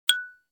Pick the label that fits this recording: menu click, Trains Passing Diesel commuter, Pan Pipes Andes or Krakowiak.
menu click